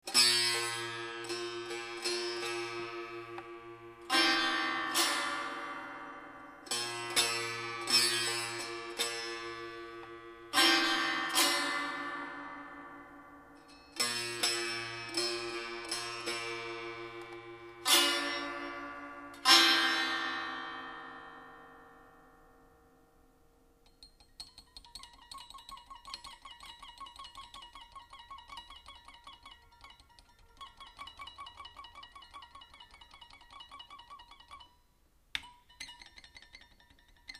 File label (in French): SITAR A DEUX CORDES; LA KERMESSE DU COLLÈGE